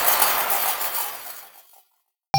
QUAREM END, PERC; NorthStar - The Wizard VOL-2; TSAR PERC

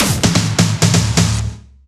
Fills 128BPM, Clubsounds

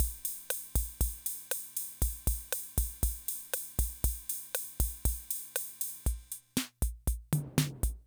Loop, rhythm 55, classic machines

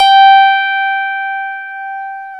PNO FM, Piano Layer

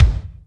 BD, DR BD; Best Service Gigapack 2

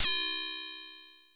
PRO1 NOISE; Crypton - DrumBase5000

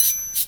SHAK, SHAKERS; Best Service Dance Mega Drums

